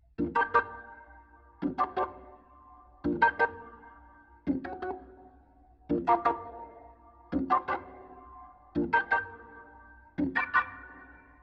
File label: Organ